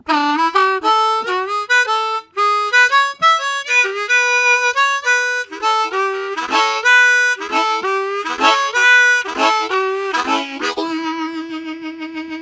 Rise and Fall, Harmonica; Zero G

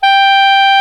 SOP SAX G5; Greytsounds VOL-1

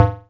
bleep, Korg Electribe ER-1; Kit 22

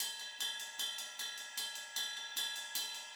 Pop-Rock Drumloops, 152 POP; USB Soundscan vol.37; AKAI; 90 s Sample CDs

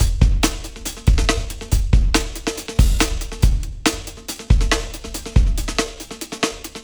Live Break, Breakbeats; KJ Sawka; Drum n Bass & Beyond

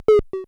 TOM, Klone Dual-Percussion-Synthesiser; KLONE FX NW8

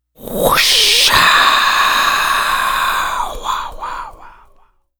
African Lead Vocal

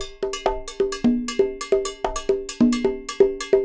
Percussion, 132; Sampleheads; NYC Percussionworks; 90 s Sample CDs

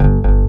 bass 3 (G) double note; Sonic Foundry (Sony Creative Software); Ugly Remnants VOL-2; Basstuff

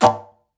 Didgeridoo Kit Samples

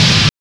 FIRE SNR; Spectrasonic Distorted Reality 1; PHUZZ DRUMS

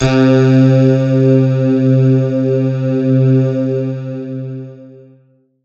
pad, Dance Synths

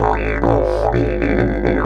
130, Didgeridoo

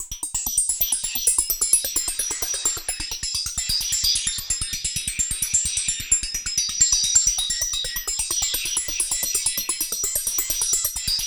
SYNTH LOOPS; Zero G; Malice In Wonderland

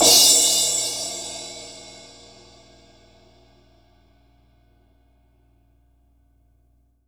CRASH, dry kit

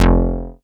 bass F1, Moog Minimoog

